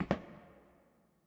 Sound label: concrete step; Minetest; backrooms